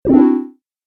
Classic Lo-fi Magic Door Close, video game sound effects